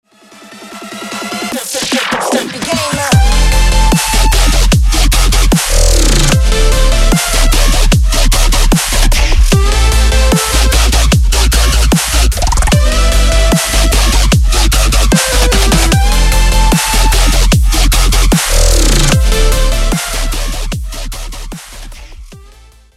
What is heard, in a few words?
Game Up